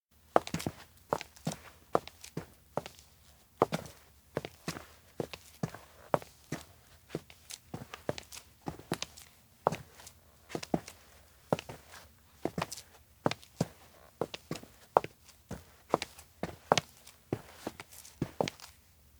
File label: steps outdoor town walk; awayuki; anemoi